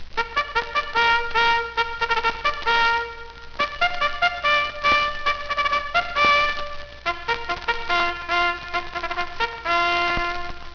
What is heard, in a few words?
mess call, Bugle; Cliffside